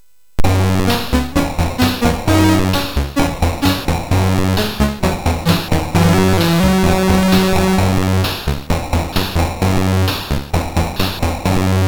Synth Sample, MOS6581R3; hvsc

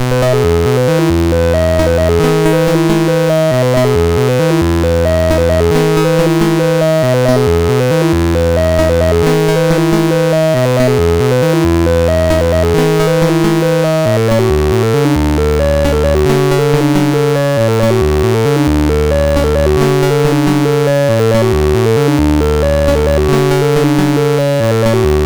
Randomly generated music BASIC, sid MOS6581R2

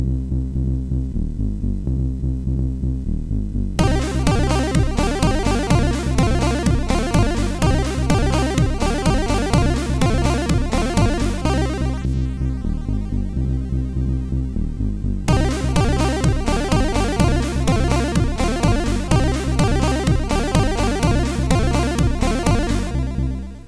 Techno Style, MOS6581R4

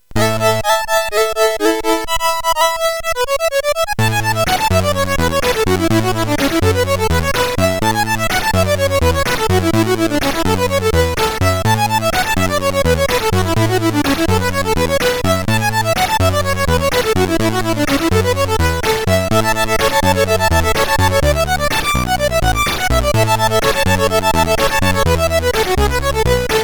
Synth Sample, CSG8580R5; hvsc

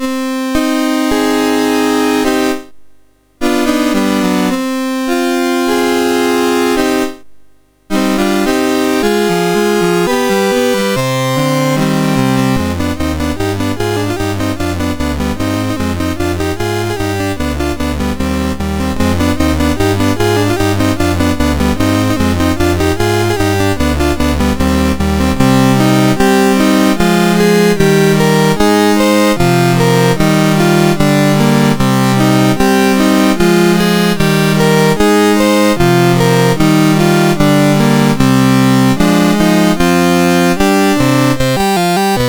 Minor Trio, MOS6581R3

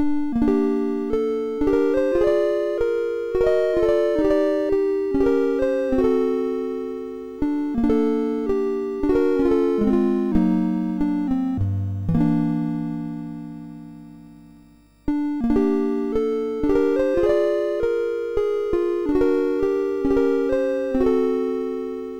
Nocturne, MOS6581R3; sid; hvsc

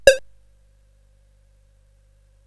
Game Effects, sid CSG8580R5; Morton Adam

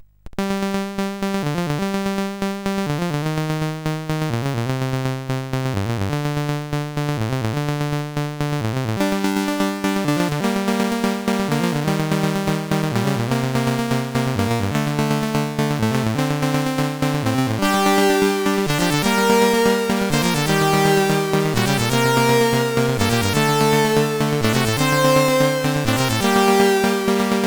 CSG8580; Ocean Reloaded

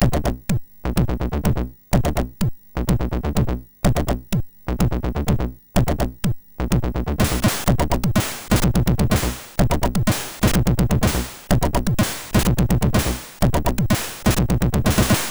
sid, MOS6581; hvsc

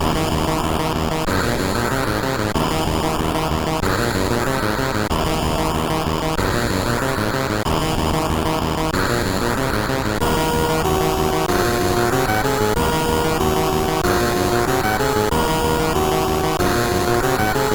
Malaria sid CSG8580R5

